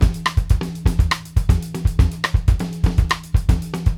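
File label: SAMBA VARI, Brazil 120; Sampleheads; New York City Drumworks VOL-1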